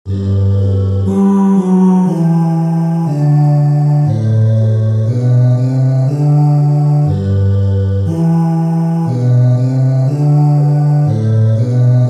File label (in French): Chorale Basse; BWV1125